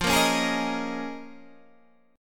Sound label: Db 6th Add 9th F